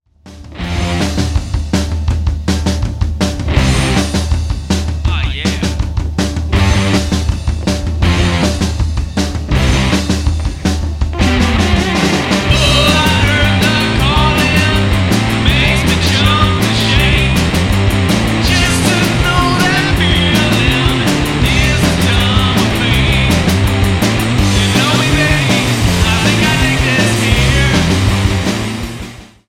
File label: Wrecked Chords